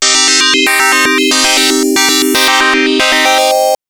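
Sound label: Synth FM minor c3; TrashLibrary; FMCyces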